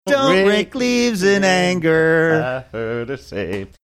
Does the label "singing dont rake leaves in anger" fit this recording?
Yes